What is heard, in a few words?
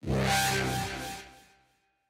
Grindy Pulse Fx